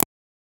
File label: subtle scroll tick sound, mouse wheel